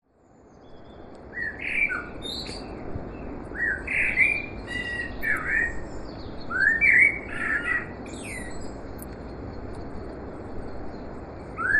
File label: blackbird